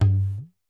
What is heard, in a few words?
perc, Roland MC-909